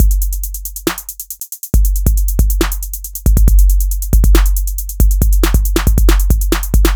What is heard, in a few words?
Groove Pizza 138bpm